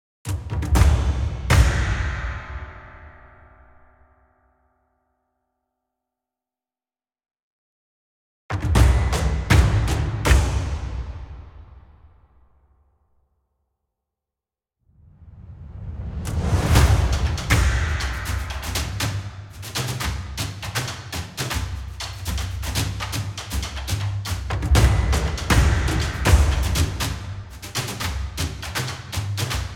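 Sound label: Real Percussion Phrase; Worms Rumble; PS4 PS5 Switch Windows Xbox One Xbox Series XS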